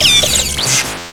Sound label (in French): Cri; XY